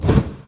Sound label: axlethrower totem vanish